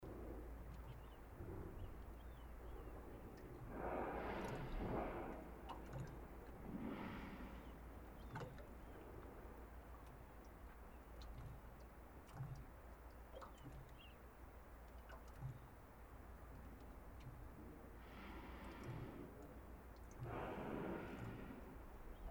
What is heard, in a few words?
Humpbacks and Murrelets